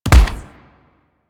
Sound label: Weapon Punch Bow; Warframe; Weapon Punch Layers